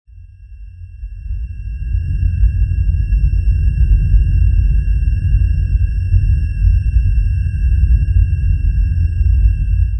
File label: a swirling energy portal, building intensity